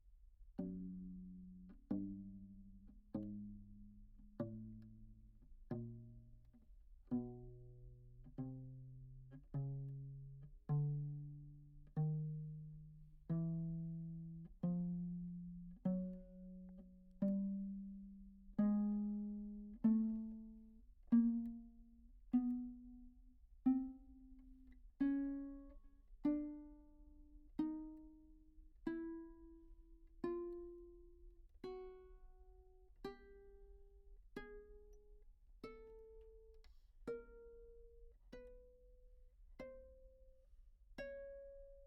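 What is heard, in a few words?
tenor viol pizz chromatic ascending, Anechoic; OPENAIR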